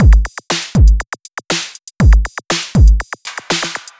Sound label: ELECTRO SET; Sony; ACID Pro 6.0